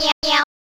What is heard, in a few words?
meow; Undertale